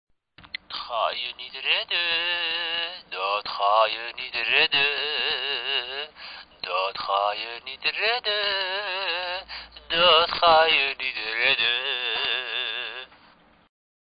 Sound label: wrongtone